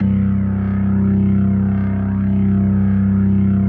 C1S, B3

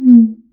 GLISSENDO; Univers Sons - Raricussions

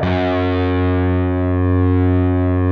PRS G, Guitars, LEAD; Spectrasonics; Hans Zimmer Guitars VOL-1

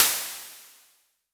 Crashes; LIMAT Div Dept; Drums

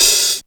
Hat, Drum Machines; 300 Drum Machines; E-MU MoPhatt

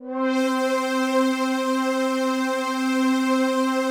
PAD, DIGI-PAD

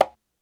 Conga Classic; Lost Tapes From Mars

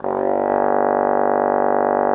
TROMBONE G1; Xsample VOL-3

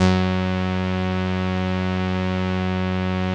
505, String Machines

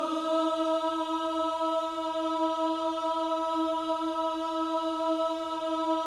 OOH, Gospel; Spectrasonics Vocal Planet

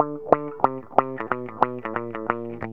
GTR, Funky; Zero-G; Funky Elements

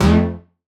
Stab; neuro; Optiv & BTK

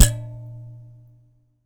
Finger Bass; Battery 3 Sample Kits

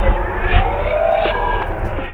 Kaos FX, Ambient Grooves

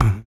HMM, Human Perc, Body Perc

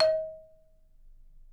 D#4, Percussion & Harp; Miroslav Vitous - Percussion & Harp

